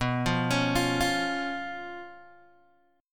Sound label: B Suspended 2nd Flat 5th, Guitar, DADGAD